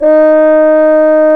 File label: Trombone, Solo Brass